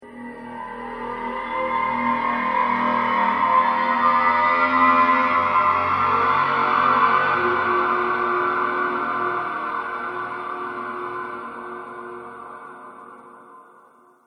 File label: Slasher Horror Transition